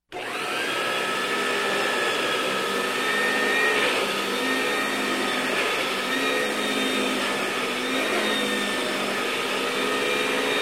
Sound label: Electric Hand Mixer